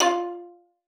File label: F4, VIOLIN SPICC; Xsample VOL-5